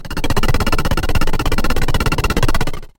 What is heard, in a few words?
earthquake; Nature